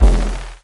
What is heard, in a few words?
Ball Blackhole; doodle jump 2; android